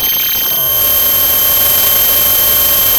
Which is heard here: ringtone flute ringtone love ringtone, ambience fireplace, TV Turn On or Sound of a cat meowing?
Sound of a cat meowing